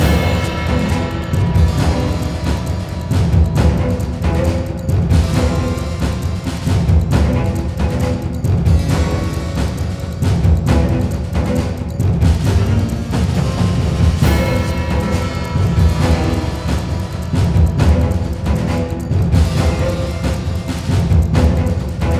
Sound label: Loop Fight, 135 Bpm; We Happy Few